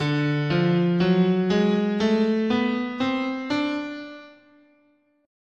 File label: D Hungarian Major, scale